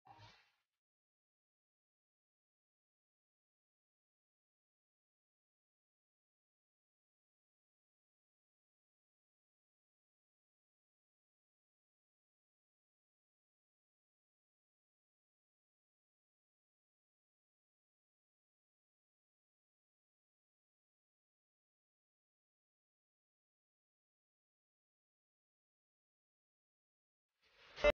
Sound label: Punjabi Tune Ringtone